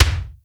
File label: DEEP K; Crypton - DrumBase5000; R70 KIT #2